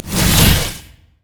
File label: deadly bloom; LoL; zyra